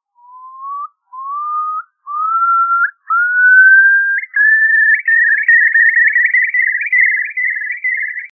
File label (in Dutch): vogel